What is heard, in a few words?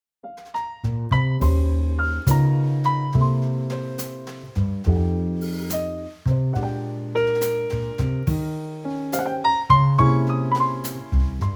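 E flat trio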